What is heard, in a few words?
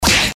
fight swish